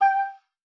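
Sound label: G5, oboe d'amore; Xsample VOL-7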